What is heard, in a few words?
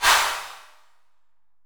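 808 clap; Wizoo - Platinum 24 Electronic; 480L SP 808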